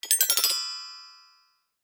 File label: toy glockenspiel keys played by child descending